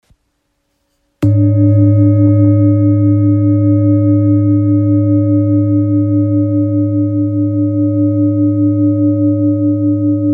Bol therapeute sol diese

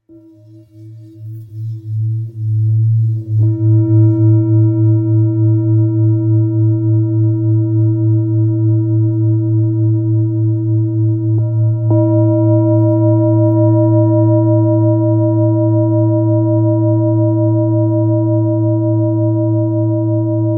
Bol devjam 2.21kg la

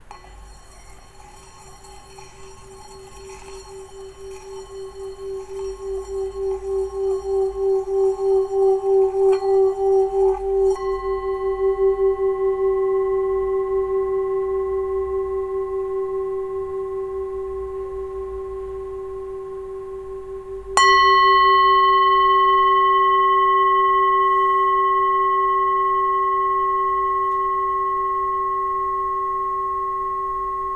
bol bengale Sol

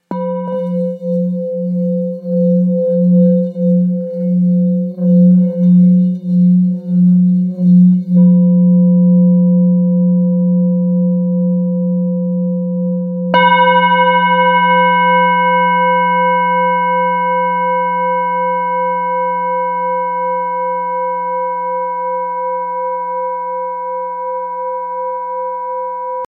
Bol ancien fa diese

